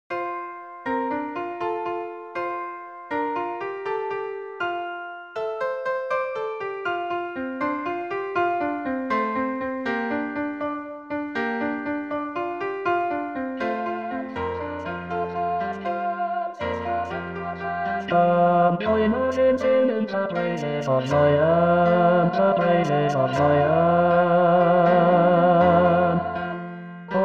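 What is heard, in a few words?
Zions Walls Tenor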